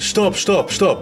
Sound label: hurt; spackenmobs; apored